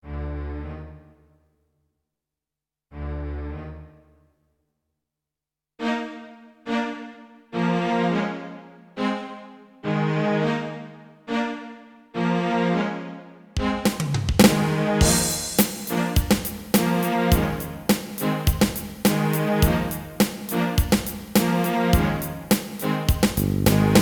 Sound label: Rock Funk